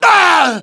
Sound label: death; mohaa; dialogue